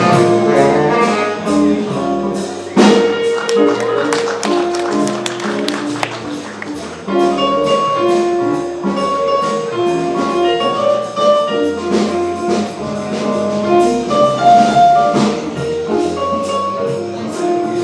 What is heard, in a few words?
some jazz in Mannenberg